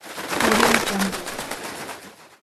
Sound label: bird flapping wings